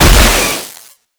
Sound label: Infested Survival Purification Weakpoint Destroy; Warframe; Nineteeen Ninety Nine